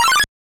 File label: warning; bad time simulator